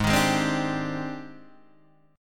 Ab Major Sharp 11th Ab, Guitar DADGAD; down

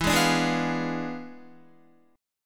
E 7th Flat 9th, down, Guitar; E7b9; strummed; JGuitar

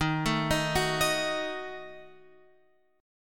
Eb Diminished Eb down Guitar Open F, Ebdim chord; Ebdim arpeggiated; JGuitar

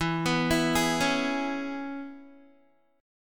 E 6th, down, Guitar; arpeggiated

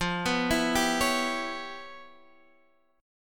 E Minor 6th F; Em6/F Chord {X 8 9 9 8 9} arpeggiated

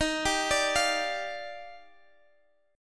D sharp Major 9th, Guitar Half Step Down; D#M9

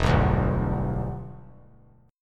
E Minor 7th, down; strummed

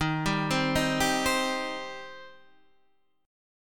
G Suspended 4th Sharp 5th D sharp, Guitar

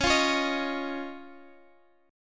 Db Major Add 9th, Guitar, Standard; strummed; x,16,15,18,16,x

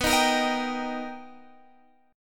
B Augmented Major 7th B Guitar Half Step Down

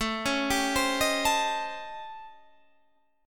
A 7th Sharp 9th Flat 5th

